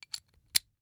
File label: Rachet, vanadium steel, 8in, standard, locking, clicking, mechanical, new, socket lock, clean; Eiravaein Works; Vaeyan IV